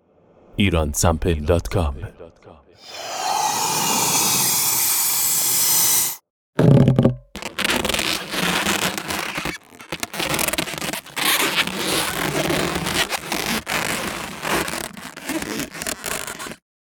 balon, ballon sound effects; Iransample

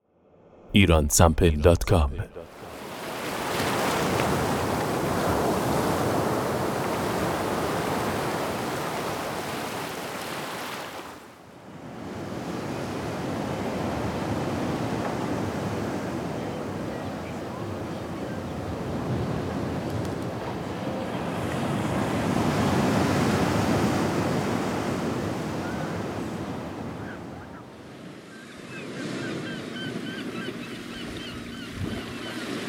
Ocean, under water; Iransample

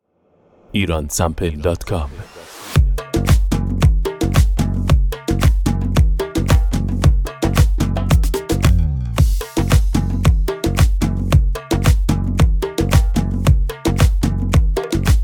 Djembe bandari; djembe bandari loops

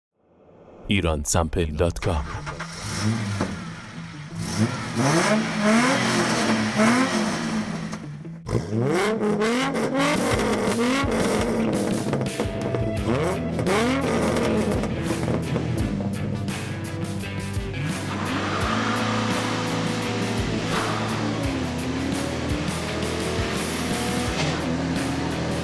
Car; Iransample

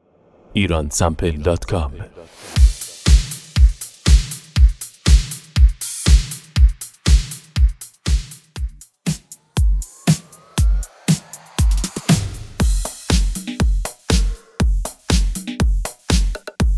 Market loop

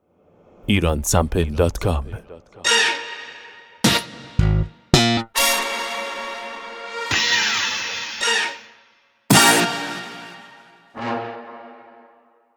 Hits solo, instrument hits

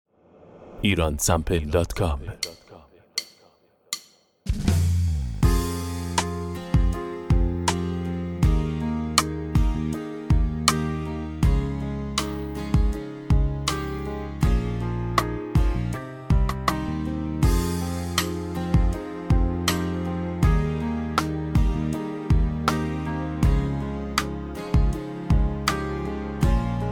rimshot, stick; rim shot stick collection

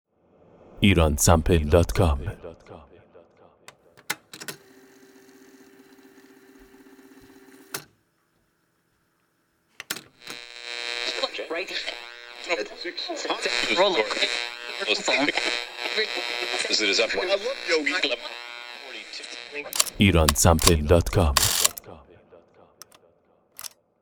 electric home, home electronics; Iransample

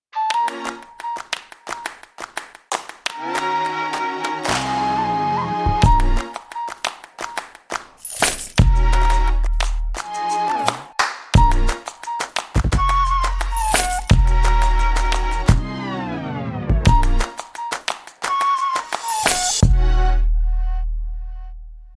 Clap

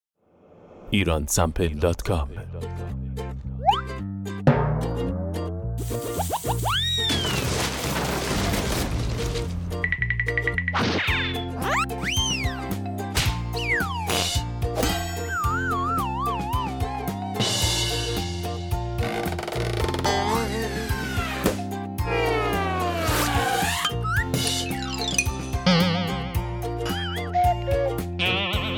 cartoon; Iransample

